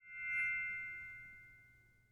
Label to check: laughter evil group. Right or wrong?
Wrong